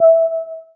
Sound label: UI Tips